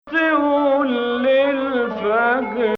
Ajam; 2nd Ajam 8/11

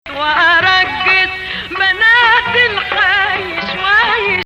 Rast Hijaz

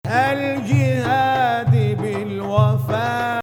Ajam Octave; Ajam, which resembles the Western Major scale, has two distinct versions

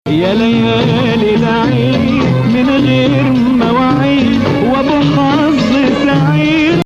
Bayati Rast

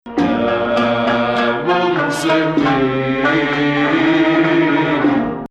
Dalansheen Ijma3 Shufna Rast

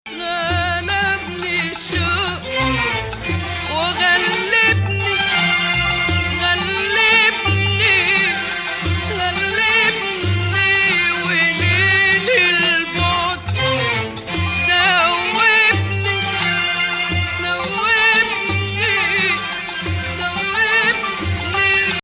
Ajam

